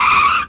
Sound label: tram squeal; vehicles; Tony Hawk's Project 8; XBOX